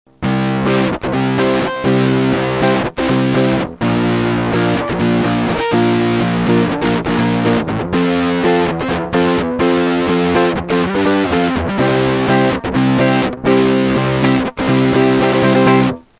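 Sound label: Scrambler, Balance 50%, Texture 50%, guitar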